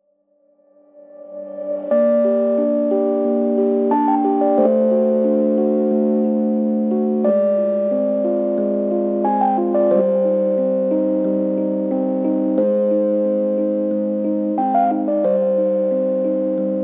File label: Coalescence Synths 90